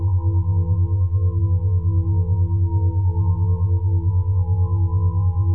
magic spell fire idle; The Monster Plane; kolmods idle

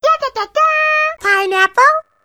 hunter stalk, idle; drg huggy wuggy snpc; elmo

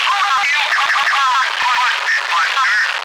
alert; drg huggy wuggy snpc; slender; corruptedwoody3; new cwoody

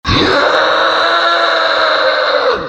scare; zoochosis panda; zoochpanda

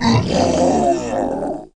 zombs death; The Monster Plane; kolmods death

